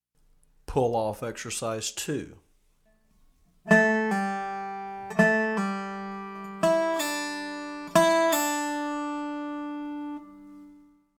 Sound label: Pull Off Exercise